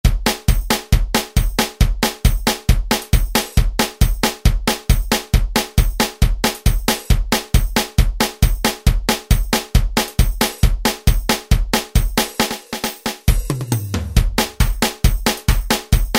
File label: Polka, Alesis SR-16; Preset Patterns